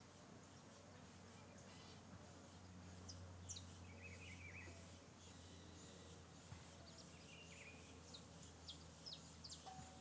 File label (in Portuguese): Cigarras no fim de tarde canto do gaviao; aves; Coleção Sonora do Cerrado